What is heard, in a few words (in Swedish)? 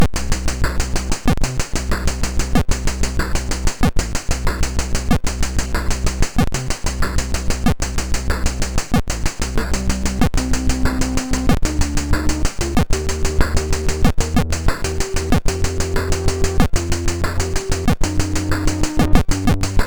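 MOS 6581 SID chip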